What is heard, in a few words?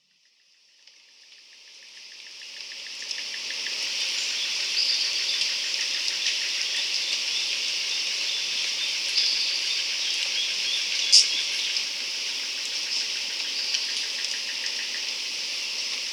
Rainforest Sounds